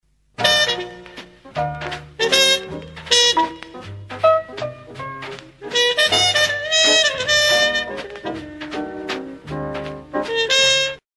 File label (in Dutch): De trompet met of zonder demper met